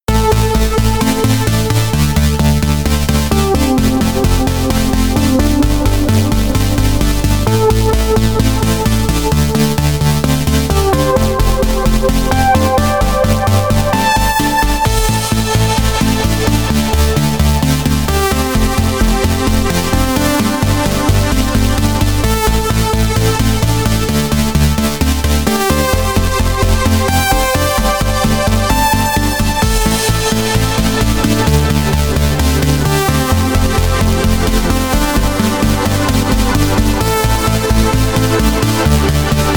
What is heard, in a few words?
chord test